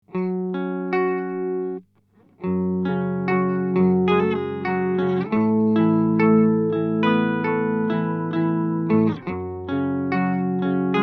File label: EHX Pico Pitch Fork Octave down u Clean